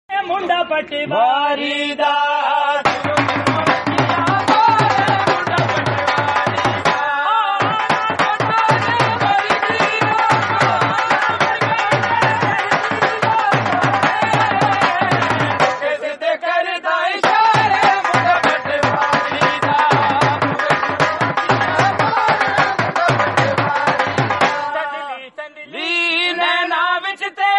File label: Remix Dhol Pakistani